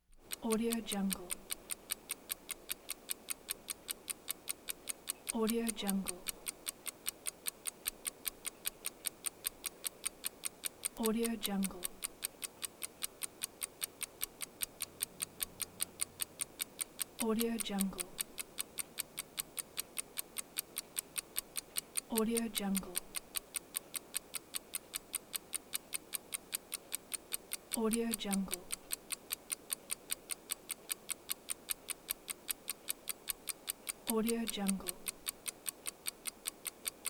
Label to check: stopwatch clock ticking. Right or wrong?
Right